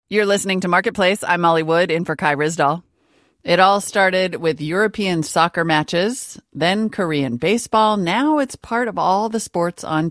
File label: Crowd Noise; marketplace